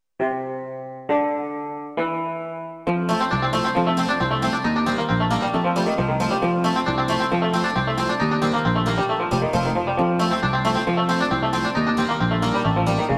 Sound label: banjo